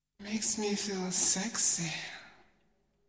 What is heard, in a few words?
Jingle